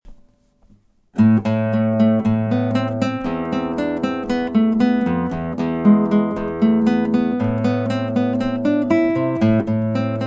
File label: Rock Tango